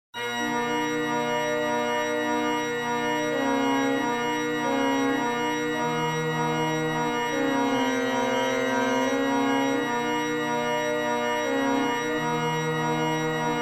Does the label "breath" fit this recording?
No